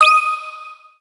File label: interaction puzzle